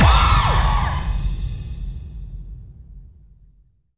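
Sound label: bass drum FX, dance drums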